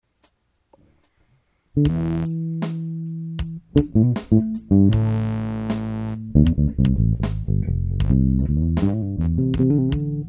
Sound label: Psychedelia Interlude